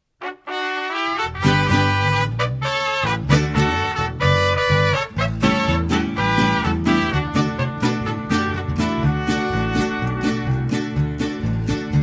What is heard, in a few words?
Mariachi